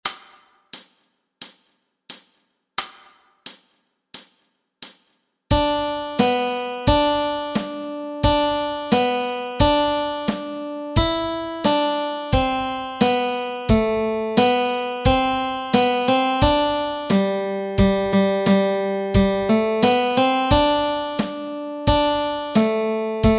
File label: This Old Man Chords melody